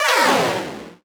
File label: Retro Portal Crossing FX; Video Game; Cymatics; Video Game Sample Pack Vol 1